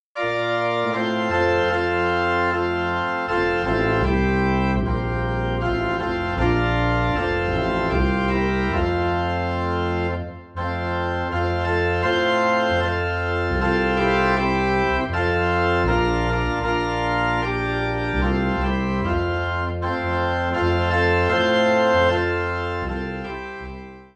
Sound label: Organ in F; The Solid Rock